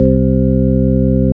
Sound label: ORG, DANCE ORGAN